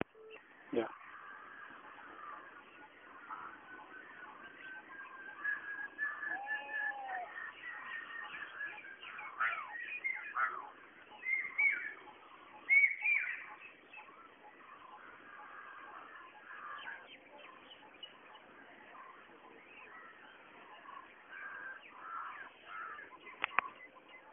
Brainfever

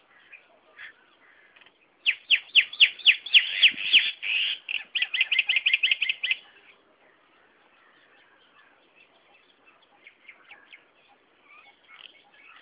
Common Myna Calls

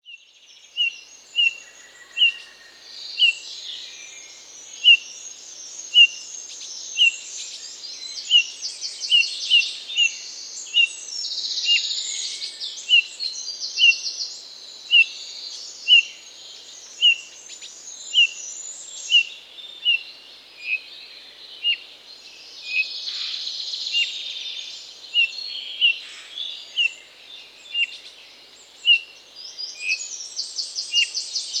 Bullfinch (Pyrrhula pyrrhula)

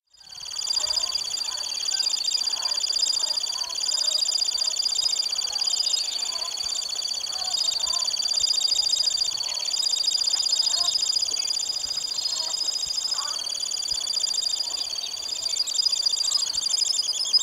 Calidris temminckii song

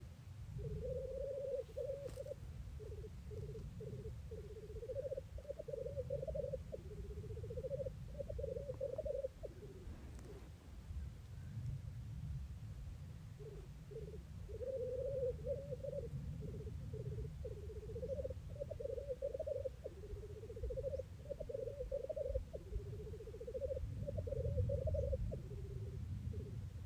Black grouse - rolling sounds

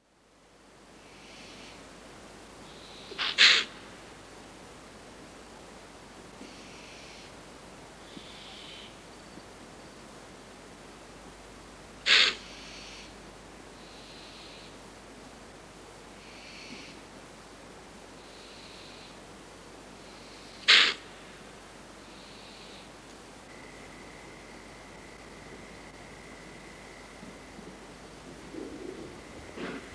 Impala (Aepyceros melampus)

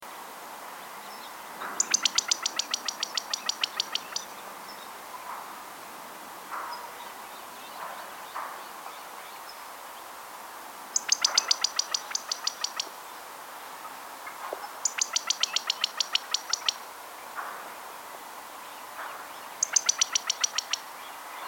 Callipepla californica - California Quail

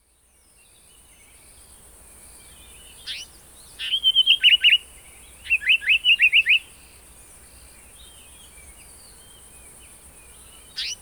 African Paradise Flycatcher (Terpsiphone viridis)

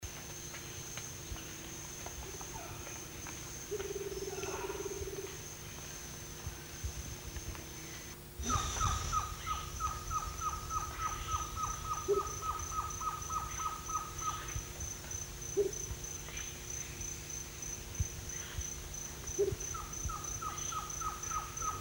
Baryphthengus martii - Rufous Motmot

